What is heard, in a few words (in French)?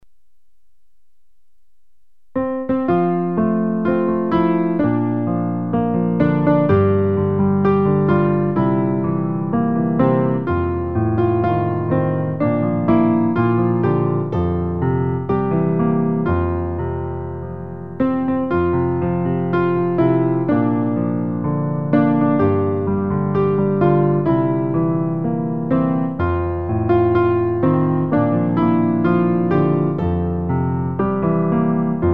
piano